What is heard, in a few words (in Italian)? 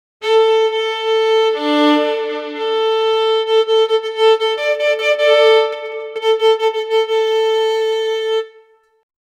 nota rapida violino; strings